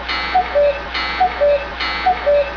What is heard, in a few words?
cuckoo